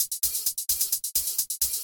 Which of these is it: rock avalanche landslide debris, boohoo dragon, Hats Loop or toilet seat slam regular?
Hats Loop